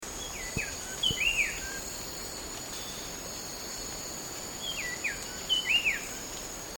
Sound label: sooty-capped babbler